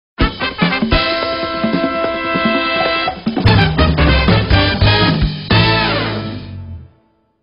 Rhythm

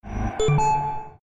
Drone Clear

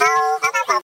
boh bubobo

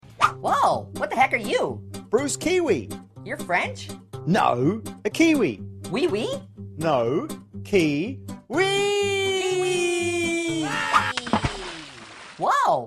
Anoying Ringtone

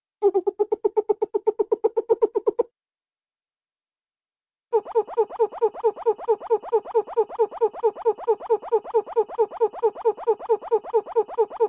hoot sms; ringtones

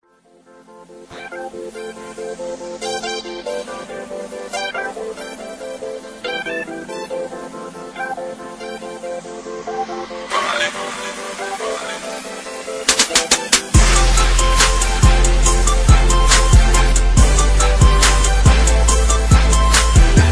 instrumental

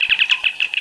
Communicator Chirp

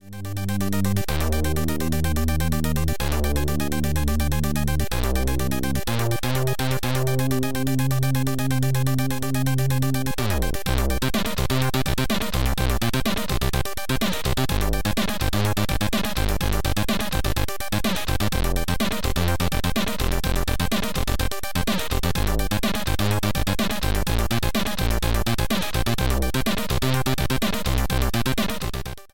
C64

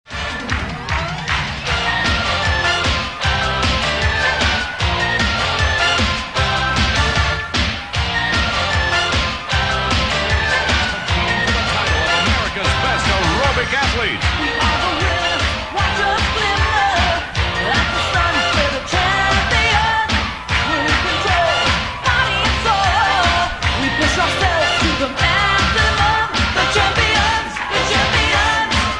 Aerobics Song, ringtones

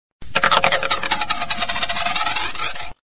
coin digital; ringtones

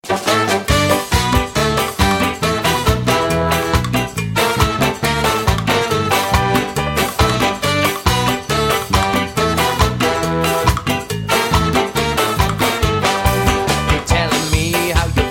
jazz

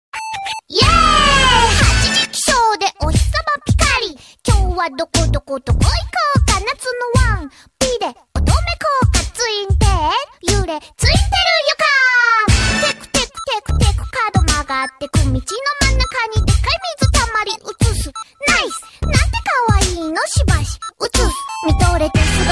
japanese rap, funny

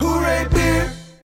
hooray

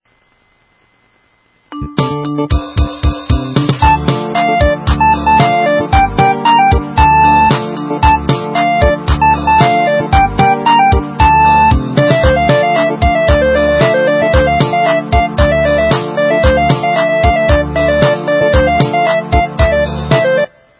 cool arabic, ringtones

